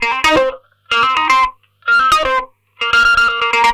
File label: Guitar, 128bpm, C1, analogue circuit; musicradar